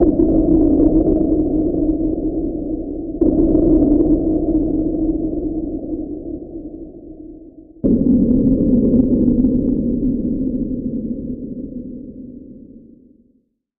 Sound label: Deep Scary Impacts